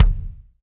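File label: bass drum, BD Real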